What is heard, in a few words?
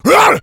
rage, infected, male